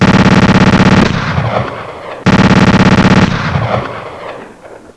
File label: 357 b mag